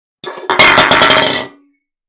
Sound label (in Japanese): 空の缶が転がる音